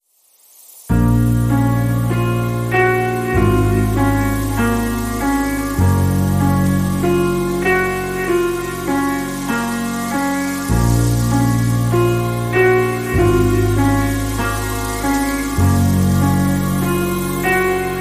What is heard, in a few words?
Clean Inst